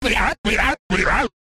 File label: laugh